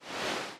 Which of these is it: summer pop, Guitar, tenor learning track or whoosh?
whoosh